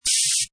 Paint Spray Gun Short, Aerosol, spray can, paint spray gun, even shorter burst; Spraying; Objects; Lynne Music